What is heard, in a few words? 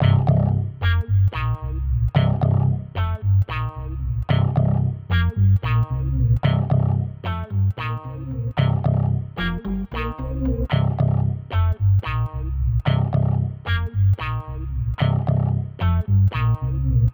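Memphis 112 Bass + Guitar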